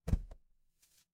impact slam

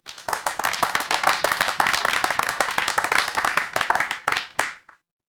crowd applause

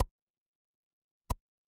ui pop

